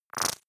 splutter short, zaps buzzes

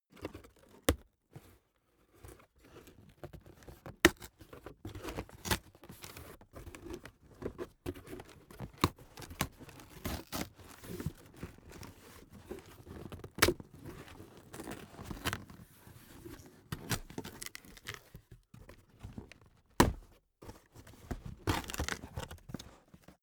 cardboard box small open handle; objekte

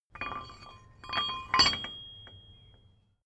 metal drawbar handling

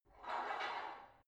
barbell heavy plate pull out reverby; sport freizeit; fitnessstudio

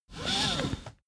car seatbelt release fast

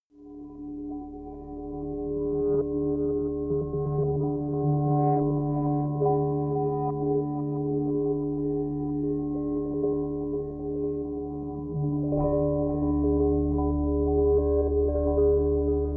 reversed chimes; flaechen

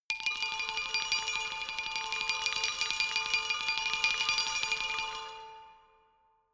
glockenspiel mysterious tremolo low; percussion

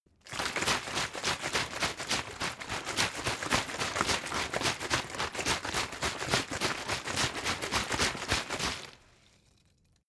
bottle water sparse shake; gastronomie; berufe

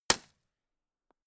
gun shot silencer

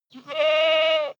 goat baa high male

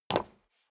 step sneaker wood creak single stair up

